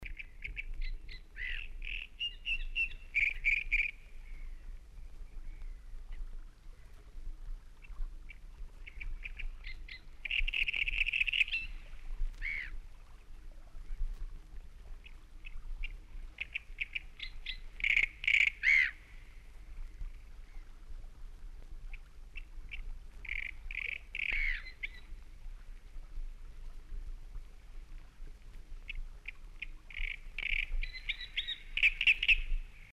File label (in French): chant ac ar; Rousserolles et phragmites